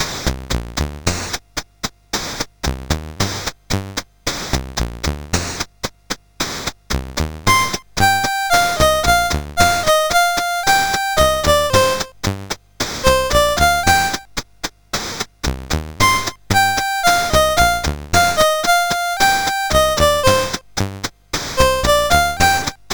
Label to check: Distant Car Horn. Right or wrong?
Wrong